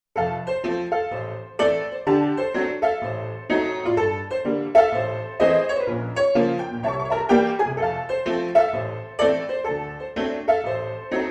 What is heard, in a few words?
Stride Piano